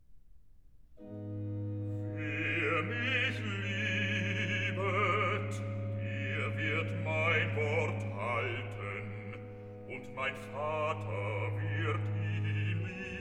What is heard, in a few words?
Recitative; Bach; Cantatas; BWV 172; DGG; Erschallet, ihr Lieder